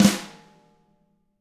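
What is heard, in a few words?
tama flam; ILIO; Double Platinum Drums 1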